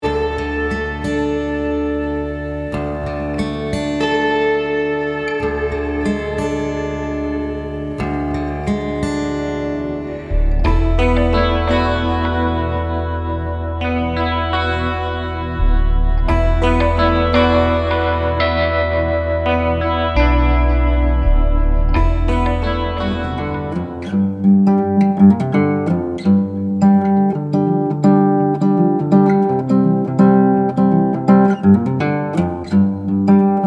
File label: Acoustic Reflections